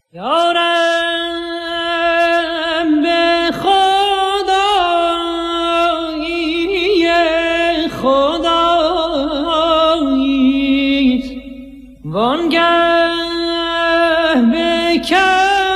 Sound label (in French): Chants arabes